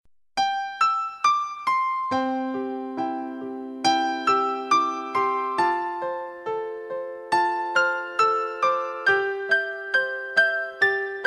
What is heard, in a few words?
jingle bells slow